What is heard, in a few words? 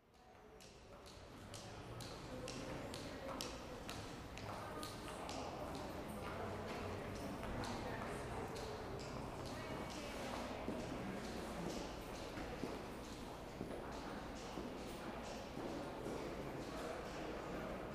Large Interior Room, lobbys